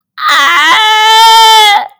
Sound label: aaahuuuuuuhhhh